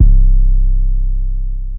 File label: Bounce Jump; Feel